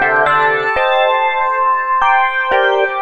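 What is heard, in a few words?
extra lead string (rave trance); Sonic Foundry (Sony Creative Software); Ugly Remnants VOL-2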